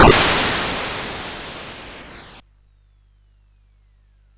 Frantic, CSG8580R5

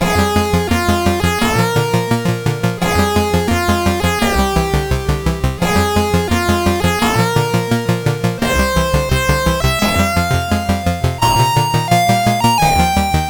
Demo Music, CSG8580R5

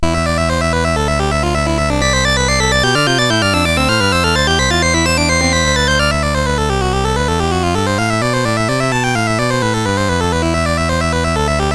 Fugue, CSG8580R5

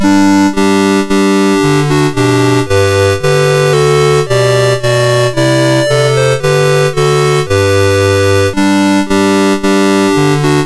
Hark the Herald Angels Sing, MOS6581R3

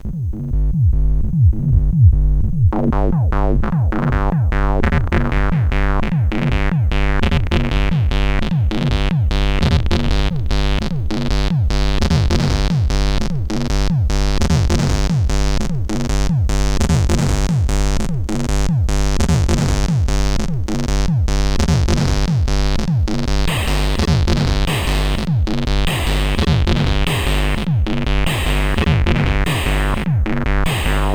Cliche Beat, CSG8580R5; hvsc

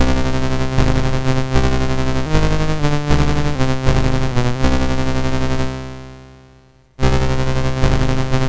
BASIC sid MOS6581R4, GAMES; Sword of Fargoal

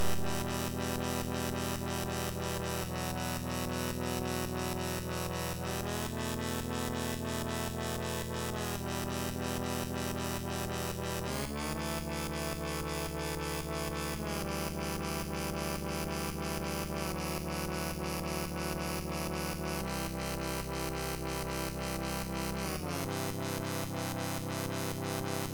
Game Over MOS6581R3; Warzoid

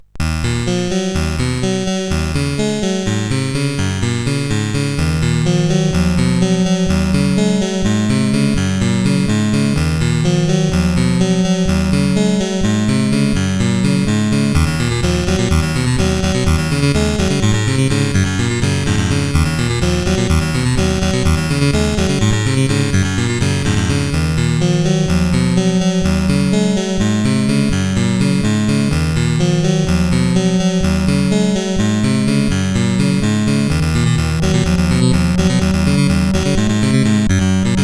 Yolkish Tune, sid, 8580

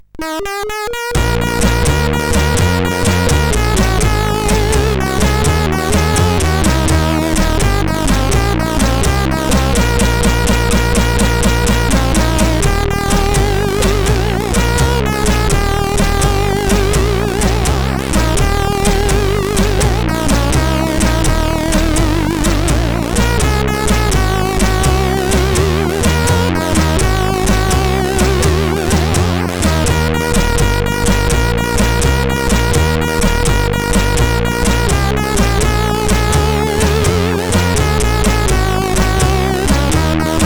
Intro, CSG8580R5; Savage Crackings

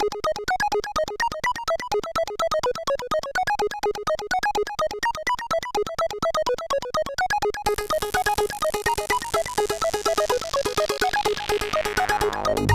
Funky, sid CSG8580R5; Hybrid Song Funky Stars

